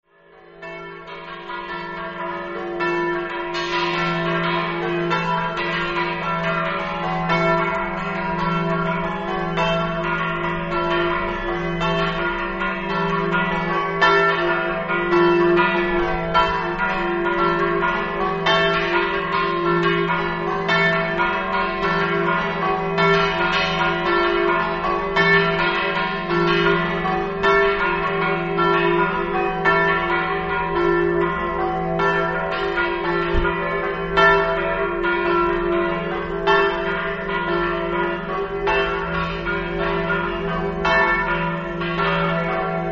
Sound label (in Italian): campane; ipplis